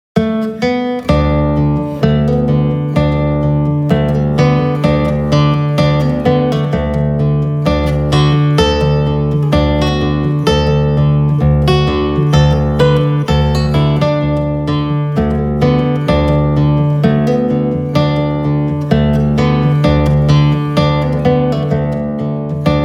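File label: Death Come Creeping, guitar; Saitenwege nach Nordamerika Band 1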